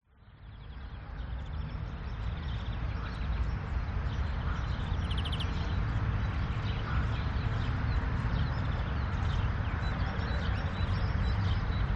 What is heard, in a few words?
Spring Morning City Park Ambience; west wolf